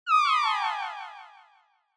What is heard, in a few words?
Negative High Long; Onoma; オノマトペ ネガティブ; 高 長